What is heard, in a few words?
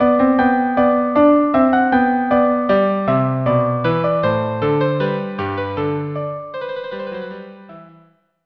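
violin cello; La Rej Telemann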